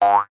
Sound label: cartoon spring boing jump jaw harp short punchy